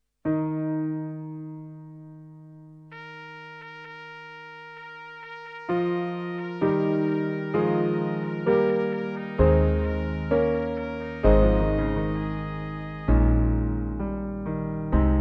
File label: air oboe